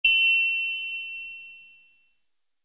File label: writeoff ding only; Toontown Online